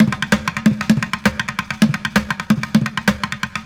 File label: Street Groove, Ambient Grooves